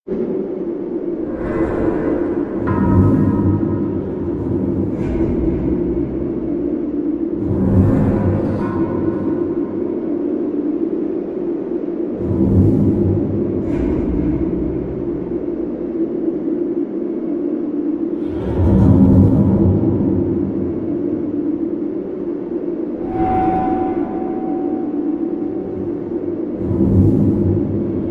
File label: Underground Ambience; Criminality